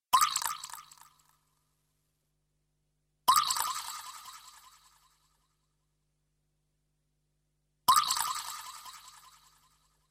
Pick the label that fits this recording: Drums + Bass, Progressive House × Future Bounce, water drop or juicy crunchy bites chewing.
water drop